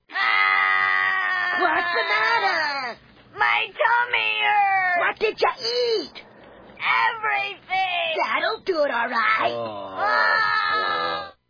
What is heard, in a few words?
crying because his tummy hurts; foul pill